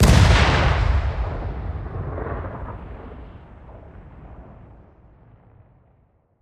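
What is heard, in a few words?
Reaper Nuke Destruct Explosion Mid Distance; Titanfall 2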